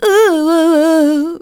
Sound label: vocals sung; Techno Trance Essentials